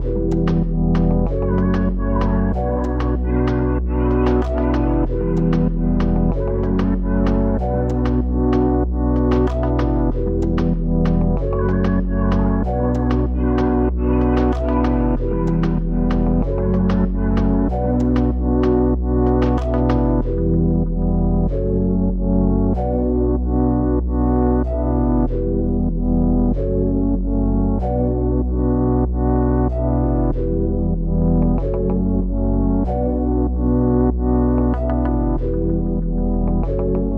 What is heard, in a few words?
95 BPM F# Min (Latin), Melody; Cymatics; PARADOX II; Melody Collection